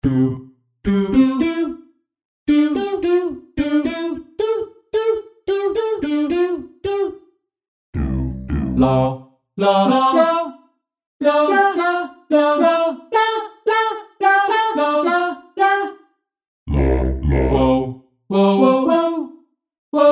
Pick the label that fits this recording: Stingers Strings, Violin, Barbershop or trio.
Barbershop